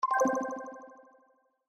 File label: System, ICQ sounds; ICQ6.5